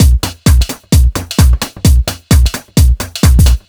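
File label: No snare, Drum Loops 130bpm; Zero-G; Soundclash Nu Dance Electro; Acidized Wavs; Riddim Clash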